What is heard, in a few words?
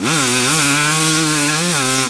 Power, Engine